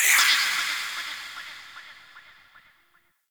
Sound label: Hallow Spirit Vocal Death; Halloween